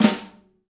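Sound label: Pop Snare Flam; Snares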